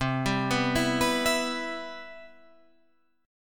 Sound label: C Major 7th, chord